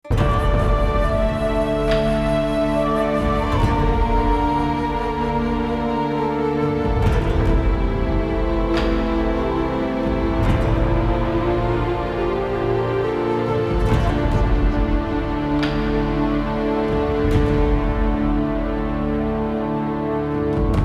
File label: Minor Lose; battlestations midway; Japanese